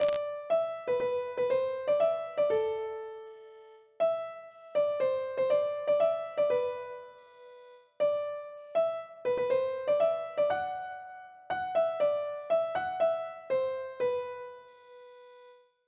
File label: contrab